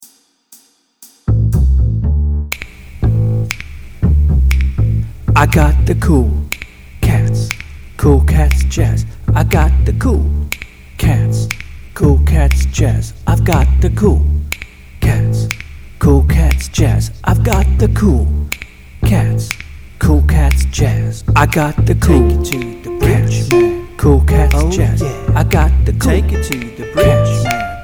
cool cats jazz full

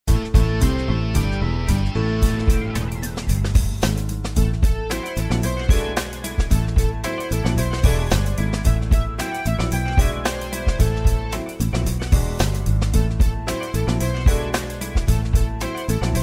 rumpus (backing track)

